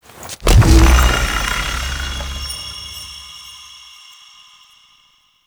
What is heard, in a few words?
Ghost Pedestal Activate; Warframe; Ambience; Duviri; Bombastine Encounter